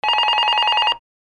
PHONES

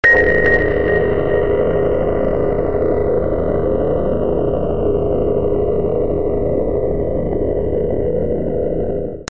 BELLS

